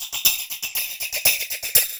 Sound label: TAMB; Zero-G; Chemical Beats